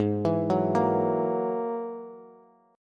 Ab 7th Suspended 2nd Sharp 5th, Bass, Bass Standard; Ab7sus2#5 arpeggiated; JGuitar